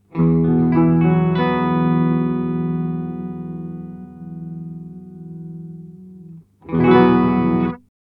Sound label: Mooer Tender Octave X2 Sub Octave mit direct signal